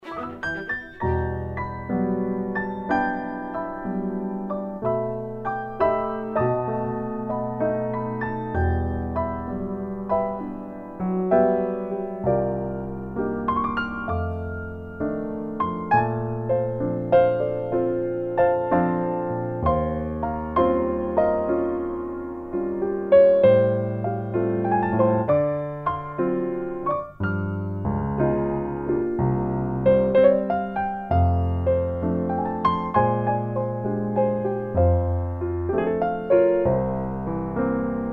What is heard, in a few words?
Bar Piano Music